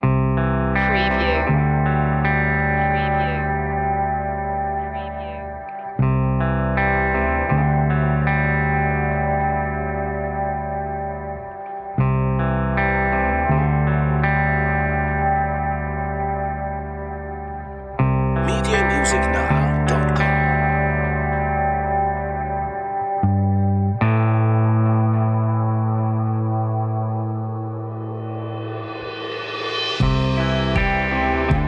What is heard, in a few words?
awakened no synths